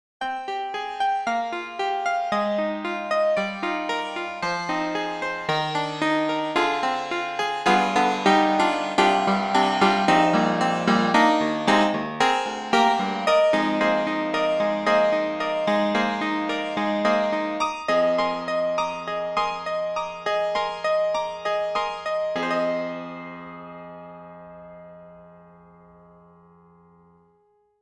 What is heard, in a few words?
Pianoforte 1800